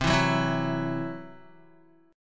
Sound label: C Major Flat 5th C down Guitar; strummed; CMb5